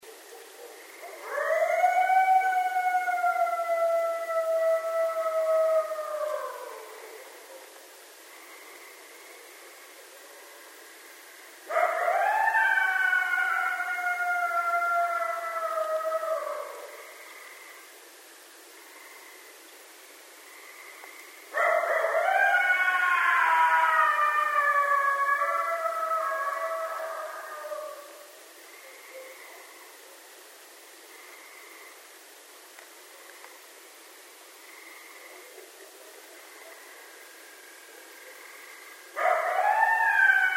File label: Coyotes; Coyotes (Canis latrans)